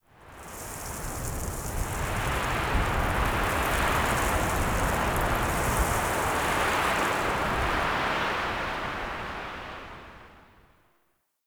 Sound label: Beach Wind Gust; Midrath Beach